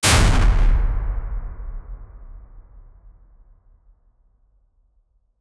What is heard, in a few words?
cinematic punch, body